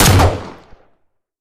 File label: pistol fire; m6h2